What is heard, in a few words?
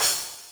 CYMBAL, Yamaha RM 50